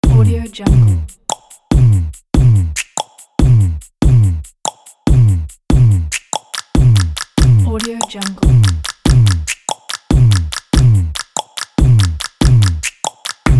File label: beatbox